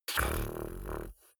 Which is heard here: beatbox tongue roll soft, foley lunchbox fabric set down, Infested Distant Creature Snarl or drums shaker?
beatbox tongue roll soft